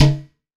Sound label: MALAYSIAN DRUM; AKAI S6000 CD-ROM - Volume 5